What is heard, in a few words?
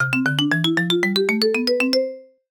comedy marimba ascend; sound effects one